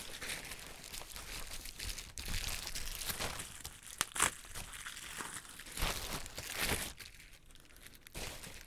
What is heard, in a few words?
Rustle Bubbe Rustle